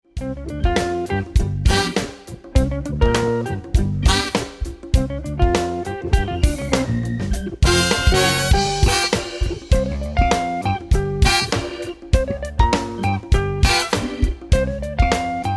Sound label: Funky